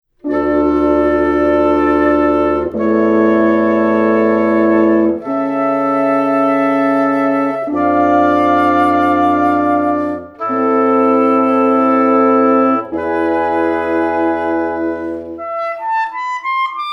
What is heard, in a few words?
wind quintet klezmer style